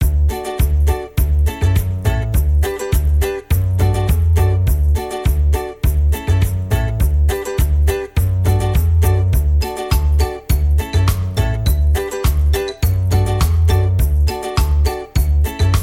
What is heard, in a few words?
Instrumental Happy Musics